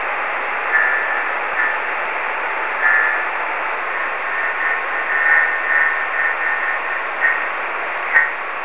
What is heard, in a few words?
4k, High Speed CW Meteor Scatter; WA8CLT at N0UK